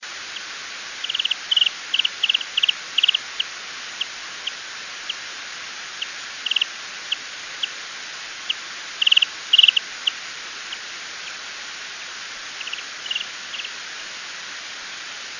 Social Townsends Trill; BigElkMeadow

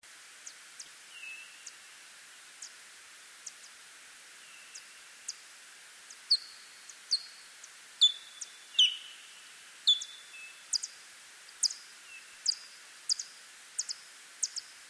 Social myotis, bats; 135Pond-OR

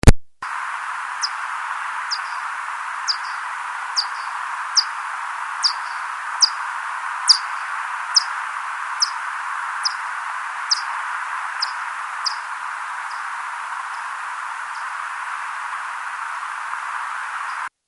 Calif myotis, bats; Eberhardt

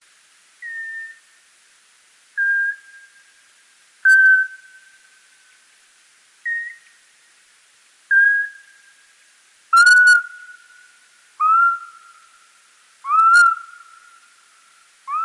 Social Hoary Bat Social; Kettle River

